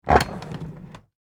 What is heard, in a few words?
Emergency Ambulance Compartment Door Open; Emergency Vehicles